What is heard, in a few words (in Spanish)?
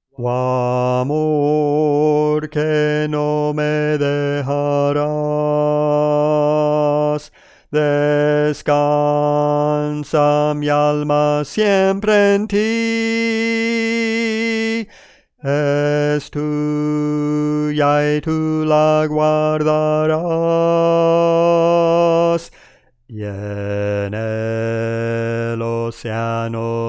Contralto, Cantos Espirituales